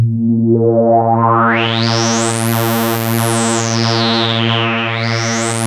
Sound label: ARP 2600 A#2, synthesizer; Hollywood Edge - Giorgio Moroder Rare Synthesizer Collection